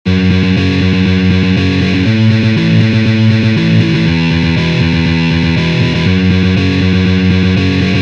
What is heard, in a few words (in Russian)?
Ritm Galop